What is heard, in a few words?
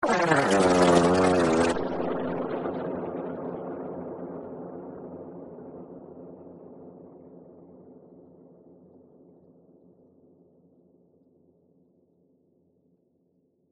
mega fart